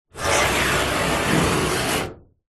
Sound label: foley metal scrape large loud